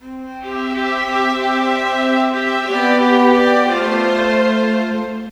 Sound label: Strings; Sonic Foundry (Sony Creative Software); Orchestral Series VOL-4; Rock and Pop; Rock-Pop 12